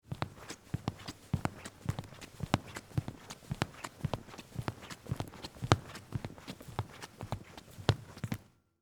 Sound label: Stage Floor Walk, Footsteps, Stage, Floor, Walk, Across, Wood, Surface, Theatre; BlastWave